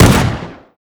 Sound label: test shotgun; Half Life Alyx